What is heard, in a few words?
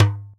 Conga; Equipped Music; Loungin House